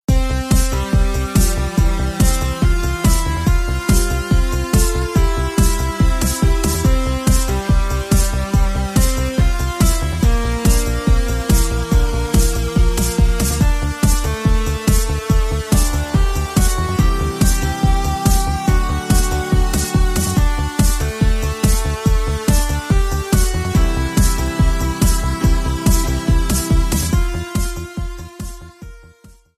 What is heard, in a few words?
theme ringtone; pokemon go